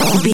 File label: retro teleport warp effect